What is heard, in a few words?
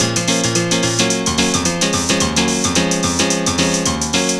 Pianos; Urban Shakedown